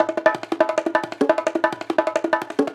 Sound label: Bongo & Conga Loops; neuro; Optiv & BTK; Drums